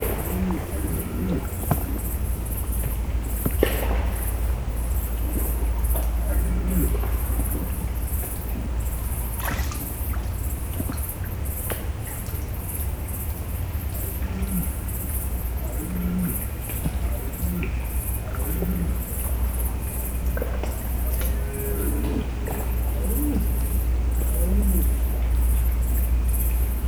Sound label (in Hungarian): gimboges tucsok halcsobbanas; termeszetben; topart; allathangok